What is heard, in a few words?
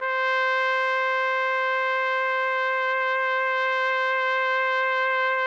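TPT, trumpet; Roland - LA Composer vol. 3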